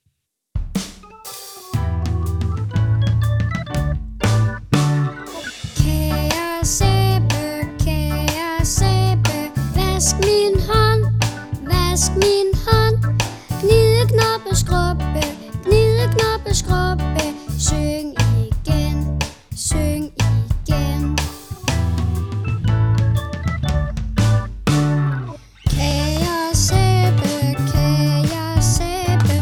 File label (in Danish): D dur